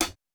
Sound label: Rock hihat; Reference Beat